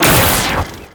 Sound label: Metronome; Amalgam Moa; Abilities